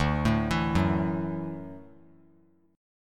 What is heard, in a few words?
D-Major Add 9th D down; arpeggiated; JGuitar